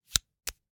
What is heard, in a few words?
Hwatu, card game, hard plastic, small, clatter, pull, slap, throw down, single card, medium; Eiravaein Works; Vaeyan IV